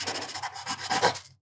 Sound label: cleaner pencil write; mod cleaner